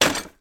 industrial hand cart bounce down single concrete step with boxes on; sound effects one